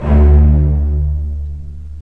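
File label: STR, strings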